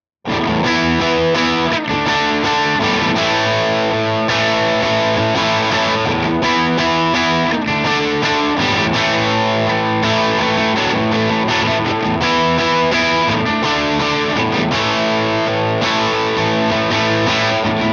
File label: crunch; jensentone